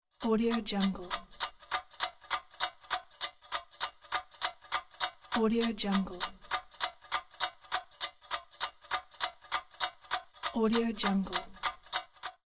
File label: clock